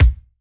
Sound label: kiks